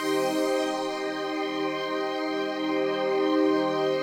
Intro strings; vostok inc. switch gamerip 2017

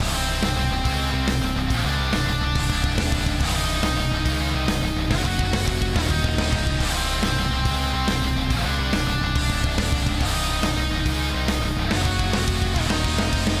Drums and Jingles Battle Lead; Metal Tales Fury of the Guitar Gods

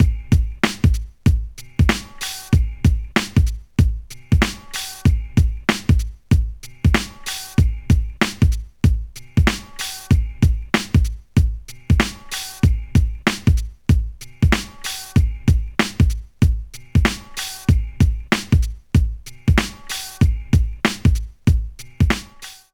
Menu Loop; NBA Street; GC; PS2

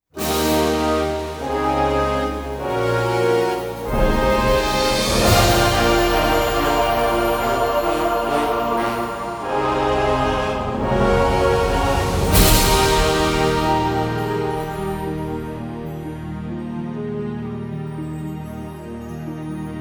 Victory Theme; Earth 2160

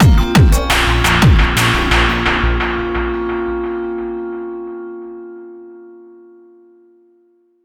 DramaticEvent; Randall

